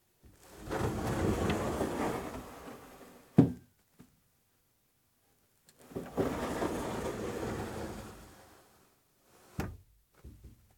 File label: door; ふすまの開閉２